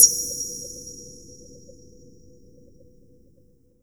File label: NOISE; USB Soundscan vol.51; House Side Of 2 Step; AKAI; NOISE FX